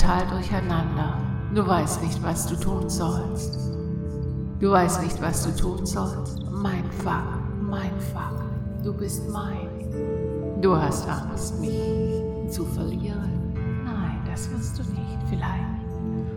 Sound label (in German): Alfawellen und Binaurale Beats; Manipulation